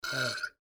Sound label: belching